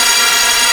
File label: PULSAR; Best Service Dream Experience; PULSAR X12